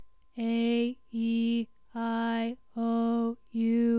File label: aeiou Low